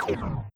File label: laser